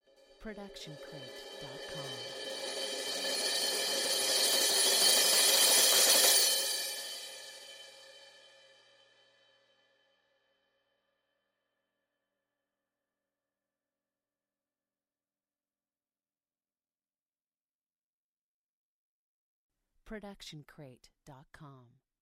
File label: crash cymbal 16 inch swell sticks long; soundscrate